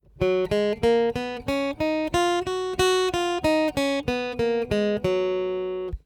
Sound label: F Major Scale, guitar